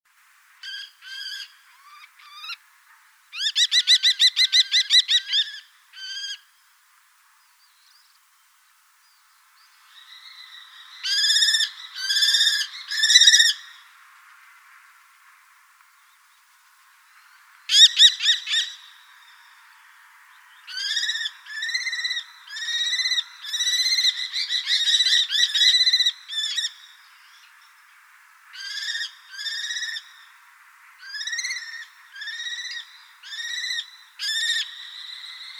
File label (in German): Turmfalke Rufe; uni-kl